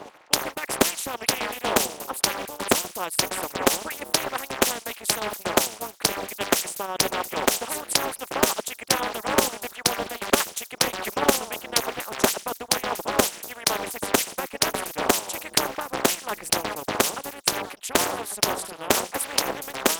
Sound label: percussive